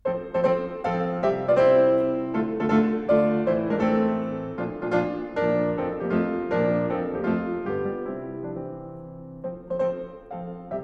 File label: Mazurkias, Piano; 4 Mazurkias, Op. 68; The Piano Works, Disc 10